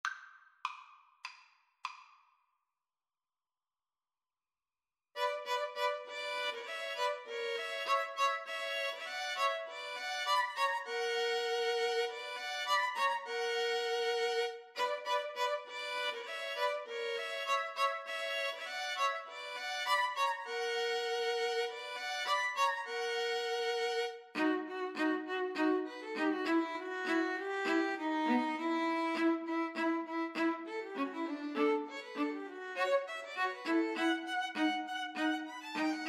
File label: string trio